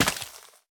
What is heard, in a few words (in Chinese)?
可疑的沙子破坏声